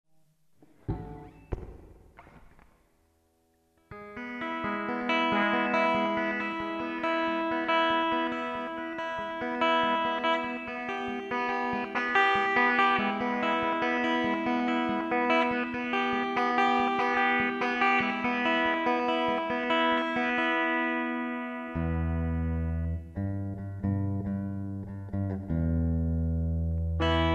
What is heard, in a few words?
Dreamt, guitar